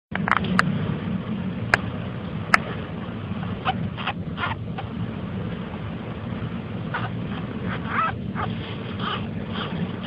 kalba seskiukai seskiukas

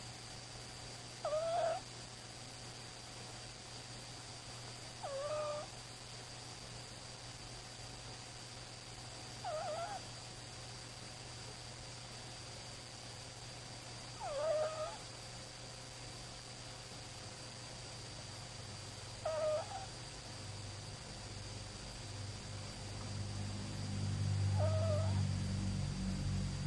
knarkimas knarkia, ferret

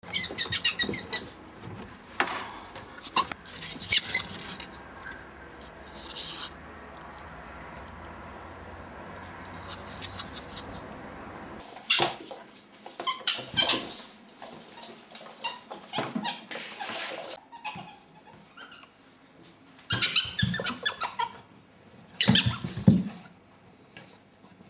bendravimas naujas seskas, ferret

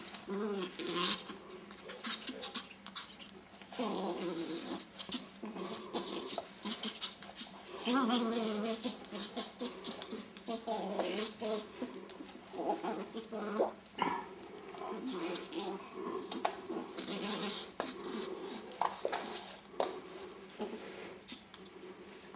misrus garsai prispaude uodega, ferret

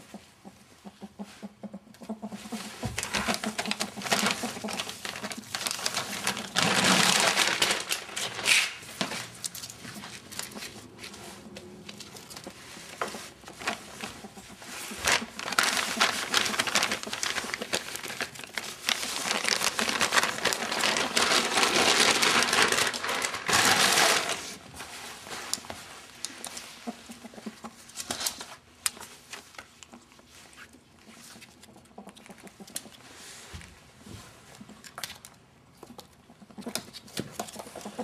gugavimo pavyzdziai su celofaniniu maiseliu, ferret

